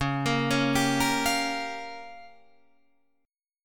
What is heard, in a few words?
Db 6th Flat 5th Db down Guitar Drop D; Db6b5; arpeggiated